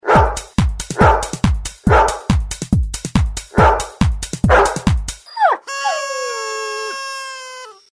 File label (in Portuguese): canção do cachorro